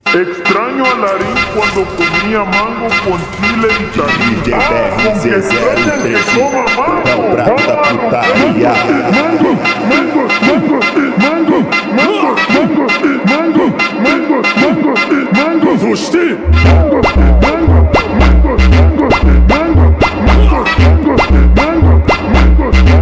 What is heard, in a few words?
Slowed Reverd